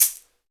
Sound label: CB, 2 Step; USB Soundscan vol.51; House Side Of 2 Step; AKAI